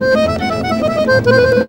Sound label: ACC TRILLER; Best Service; World Colours